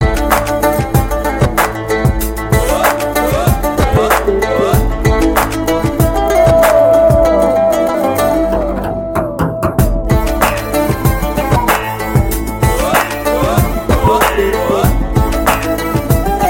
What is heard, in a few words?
guitar loud tone ringtone